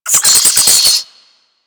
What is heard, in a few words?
Infested Minion Pain; Warframe